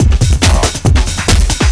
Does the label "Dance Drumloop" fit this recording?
Yes